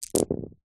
leisure toy plastic bingo balls handful drop carpet; zapsplat